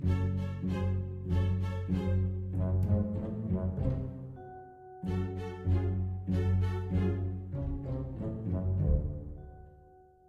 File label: music pause help menu; run-dorothy